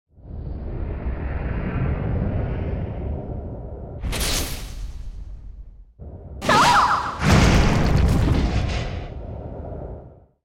Appear

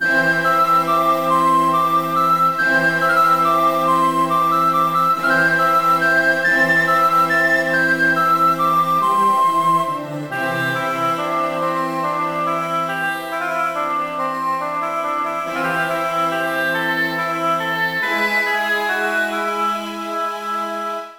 BGM ORCHESTRA

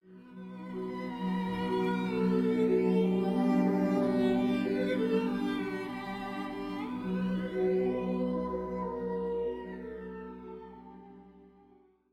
Red Violin; Dance of Death

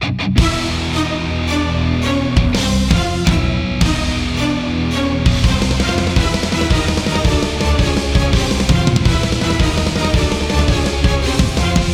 Boss Battle Opening cue

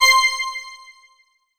Start Count Down END; All Star Fruit Racing

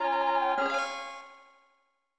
Important Item

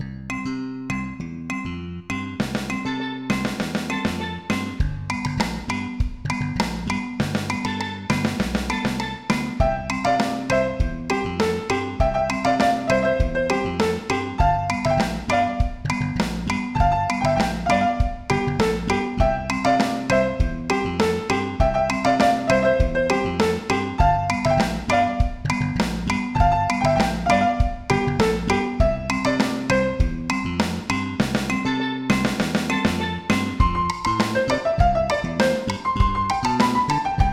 Growing up Town (General MIDI); sim city 2000; ms dos

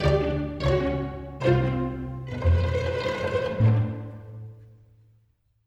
lose; Crossbow Warrior The Legend of William Tell

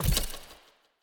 Get treasure Chest

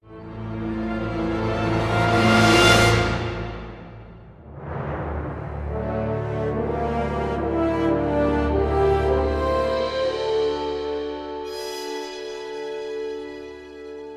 Action Heroic

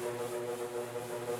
Elec Buzz loop; Puddle; puddle plus ios gamerip 2018

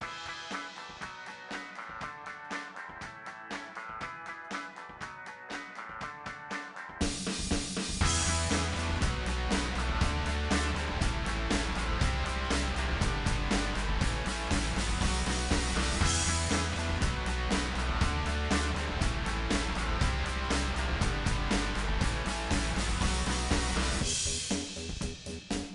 Rock; Bratz Rock Angelz

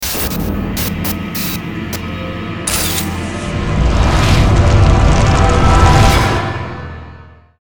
Logo Sfx And Msx; Infernal; xbox 360

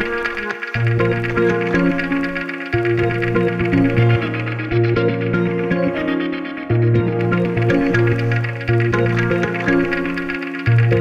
Menu Loop; Halo Hero

